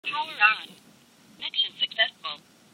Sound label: power on